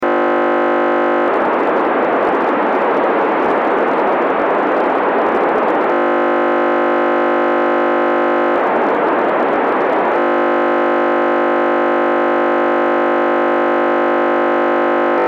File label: tetrapol; This is the second most popular trunking format in Europe